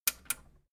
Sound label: metal button radio click enable slow